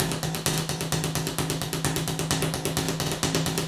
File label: Improv Percs 2 Bars 130 Bpm